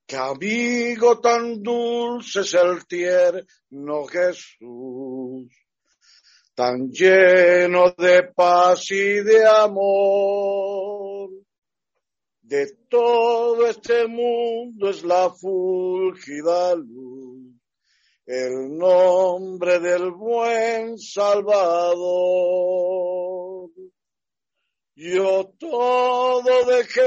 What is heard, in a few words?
So Sad And So Far From God (Spanish)